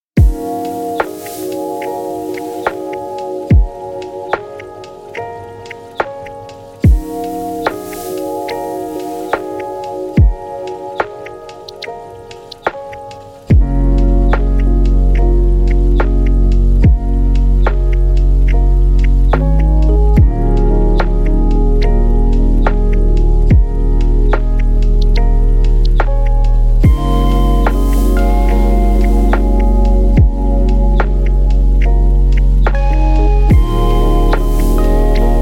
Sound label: Scratched CD-RW, lofi chill